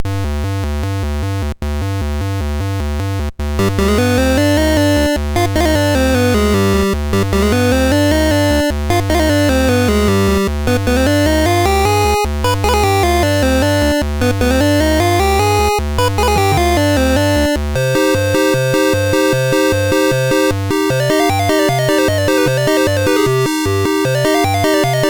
Dragonworld sid MOS6581R4

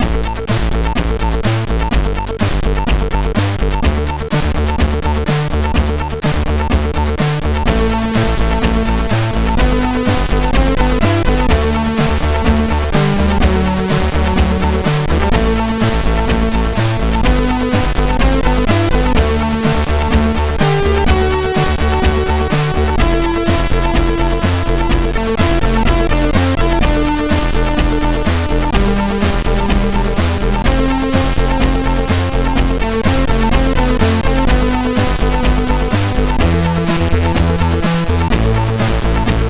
sid MOS6581R4

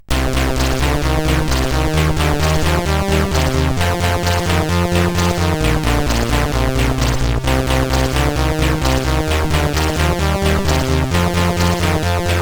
Dingdong, CSG8580R5

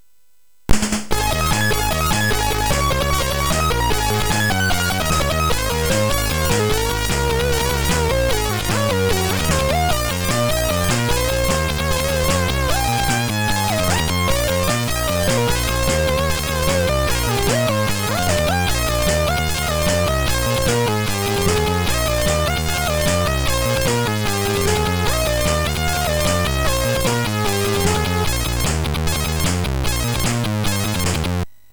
Music Demo Version, MOS6581R3; hvsc

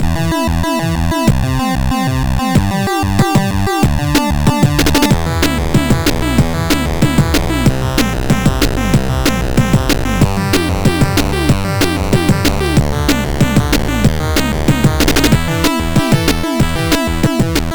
sid, MOS6581R4; Amaze

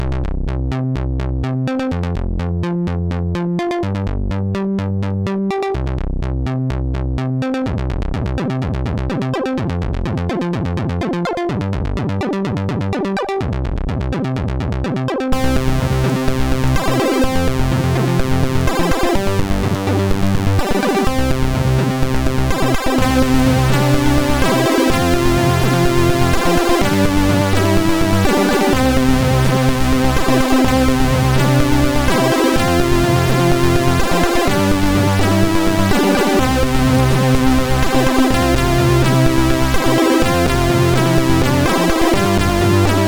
Zound Muzak, sid MOS6581R2

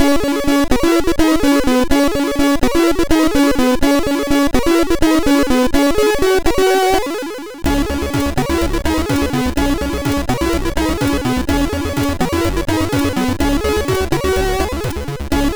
sid, MOS6581; hvsc

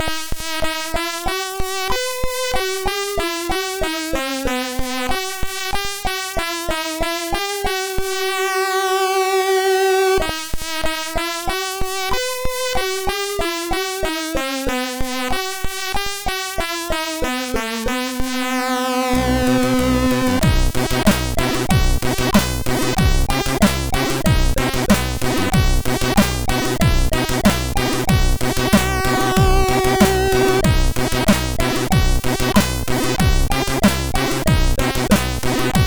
Arabian, sid, MOS6581R2

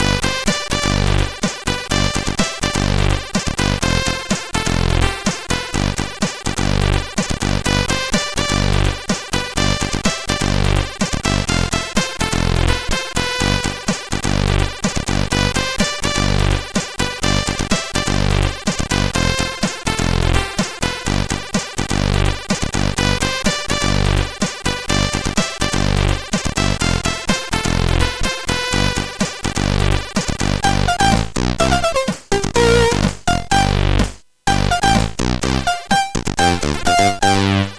Jazz, sid, CSG8580; Jazz Time

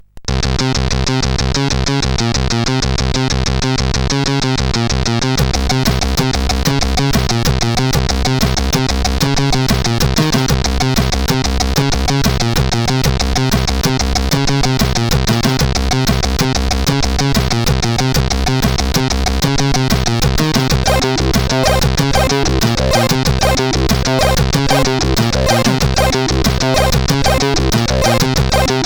sid CSG8580R5

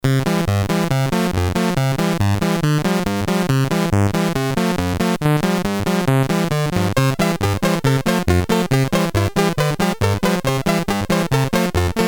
sid 8580; Bowling BASIC

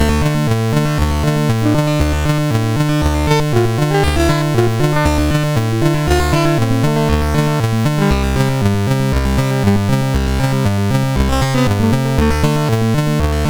sid MOS6581R4, GAMES; Frenzy

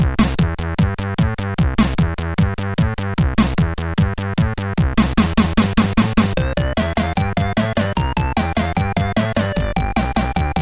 Rock and Roll, CSG8580R5

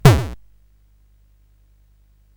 Paddle Mania, CSG8580R5

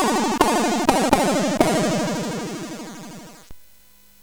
The End Jingle, CSG8580R5; hvsc

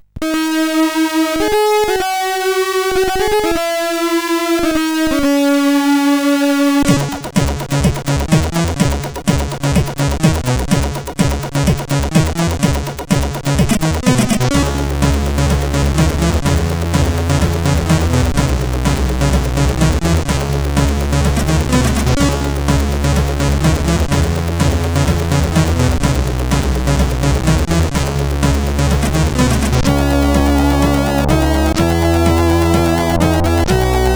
Music sid, CSG8580R5; Music Demo

